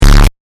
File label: bass booped